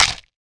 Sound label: cartoon bite munch